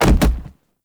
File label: Stag Charge Impact; Powers